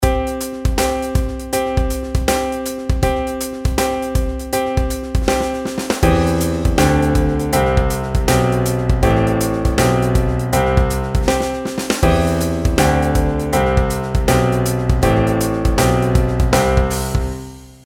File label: pitch patterns stepwise